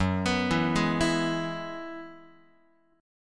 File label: F sharp 7th Suspended 2nd Suspended 4th, down, Guitar; x,2,2,2,0,3; 4A,4E,5A,5D,5Gsharp,6Csharp; arpeggiated